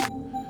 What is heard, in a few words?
Clap Blip, Drums; BB 2HTC Samples Vol 4